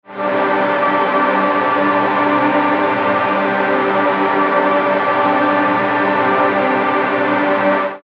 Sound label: Reso Drone